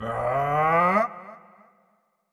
WindUp Long; Marv

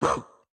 Triple Swipe Attack; Caffiend Caelan

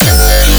Bass Growl 200 G

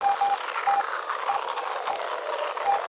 Radio; Music Tunnel Section; subway surfers city sound effects; Android, iOS

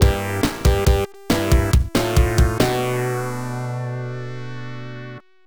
Game Over; Gley Lancer; Advanced Busterhawk Gleylancer; Genesis

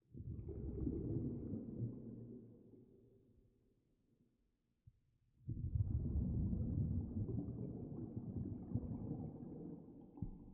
underwater bubble; PS4; Black Book

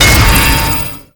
Shield Deflect; MagicItem SkyIronShield

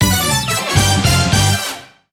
JGL SUCCESS

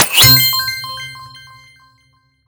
Star Reward; Skylanders Cloud Patrol; Arcade, iOS, Mobile

